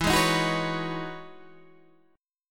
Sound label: E Major 13th down Guitar DADGAD